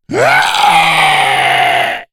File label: screamer; tungtung cursed; tungtung sahur curse